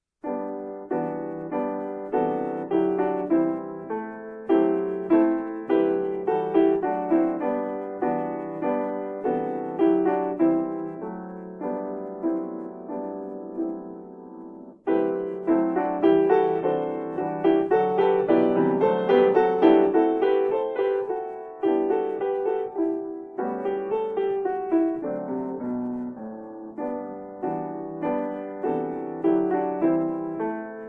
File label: Minuet Cluster Cadences; Albumleaf 41